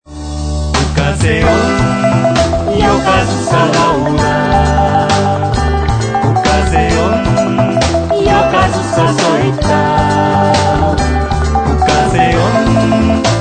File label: Stilimba